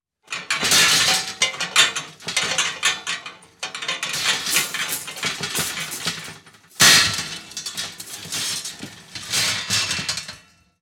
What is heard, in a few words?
Metal Cage Rattling Movement; Fairlight Sound Lib